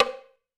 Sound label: Hollow, Alesis D4